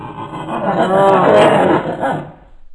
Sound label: die; cof; taller